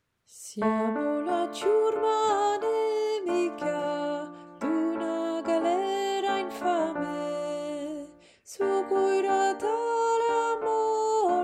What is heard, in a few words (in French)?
VOIX Soprano, chorale; LA CHORALE ANARCHISTE